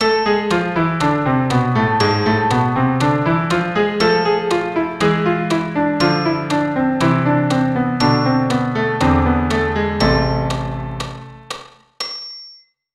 Am harmonic

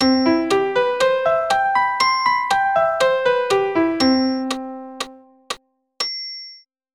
CM7 arp

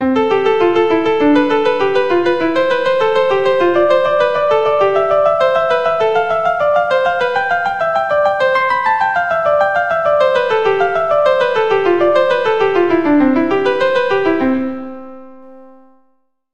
Scale, keyboard

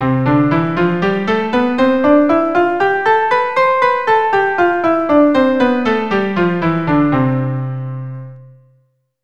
C Major, keyboard

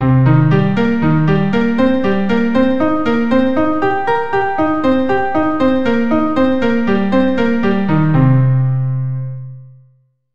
Cminor7 Broken chord

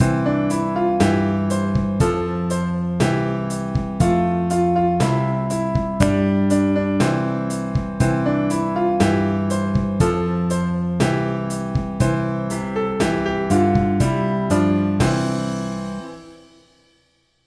Teapot, keyboard

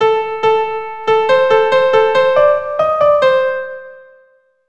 Eighths

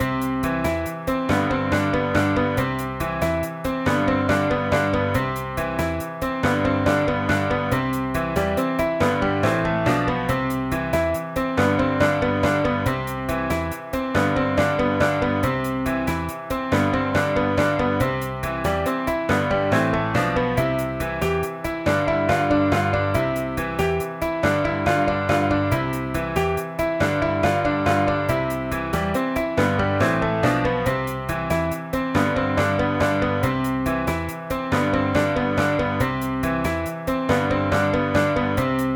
keyboard

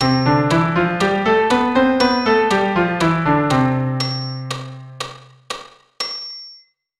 C major scale, keyboard

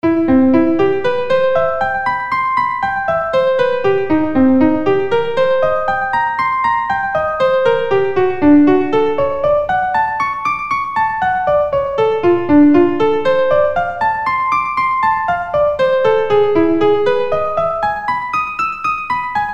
Arp

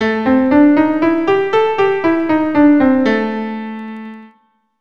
A Blues scale, keyboard

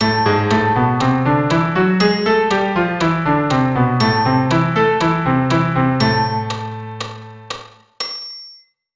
Am melodic

